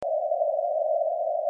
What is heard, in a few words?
bark